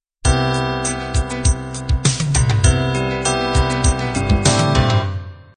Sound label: pop ballad 3 intro; Pop Ballad 3 X & Pop Ballad 3 Y compatible MIDI file Intros and Endings